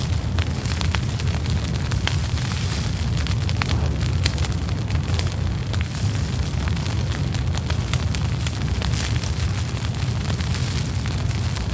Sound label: molotov fire loop; exp molotov